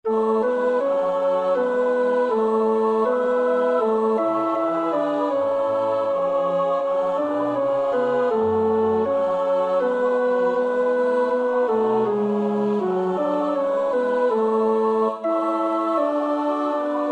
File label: tenor; Bach (Johann Sebastiaan); BWV 066